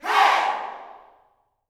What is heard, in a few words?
HEY, Gospel, YELL SHOUT; Spectrasonics; Vocal Planet